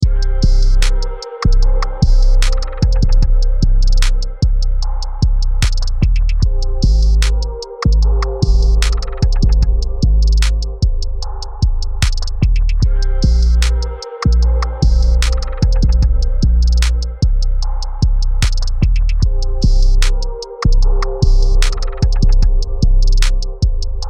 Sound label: BASSLINE